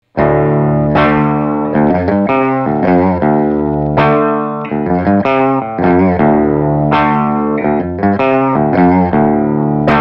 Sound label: Riff; Wanderson Zingoni